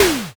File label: water balloon